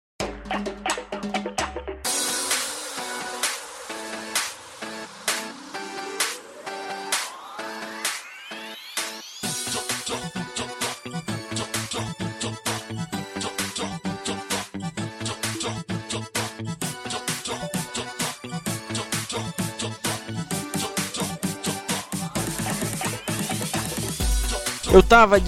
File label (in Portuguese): funk